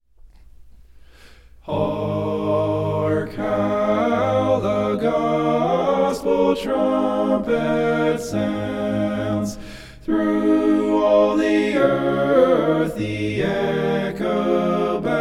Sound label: Hark How the GOSPEL TRUMPET, hymn recordings; reforming the church's song